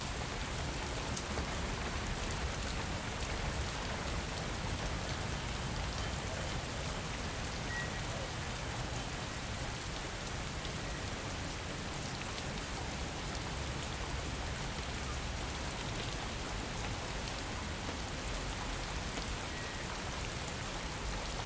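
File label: London street exterior day; the 39 steps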